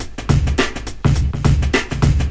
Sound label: 104 misplaced my downbeat, DRUM LOOPS and BREAKS; 101 to 110 bpm